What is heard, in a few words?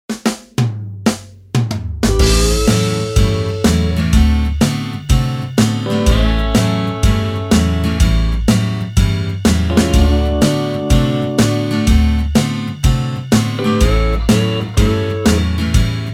countrys, backing tracks